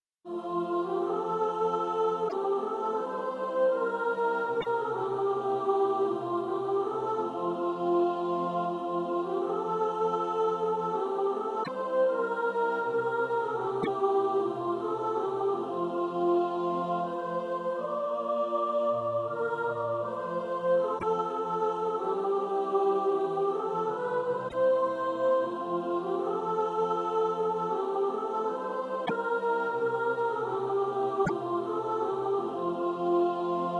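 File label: soprano, Practise Music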